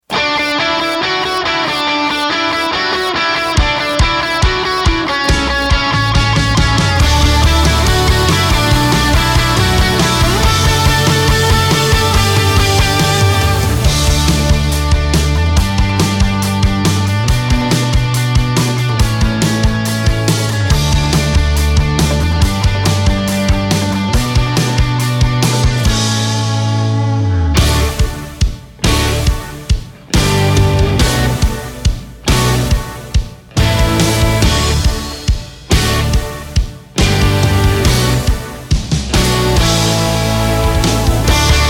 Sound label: Instrumental; vbs 2012